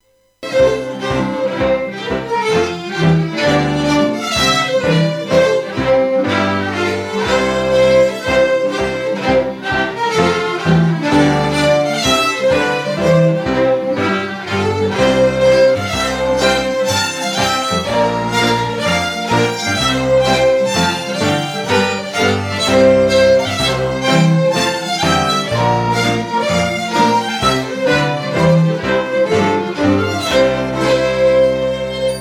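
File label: Reel, Scottish Fiddling; Pringle Col; Winter 2017 Jink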